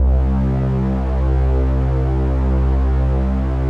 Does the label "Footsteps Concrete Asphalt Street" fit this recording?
No